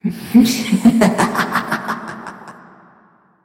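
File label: female horrible voice, creepy laugh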